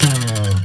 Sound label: Slides; FX; Vengeance Samples